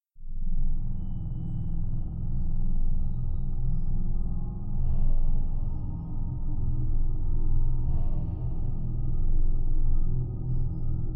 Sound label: Space